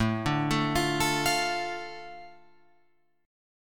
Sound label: A Suspended 4th Sharp 5th, Guitar Open F chord; Asus4#5 arpeggiated; JGuitar